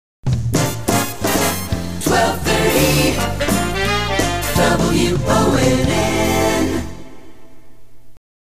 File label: jingle; WONN; 1230 WONN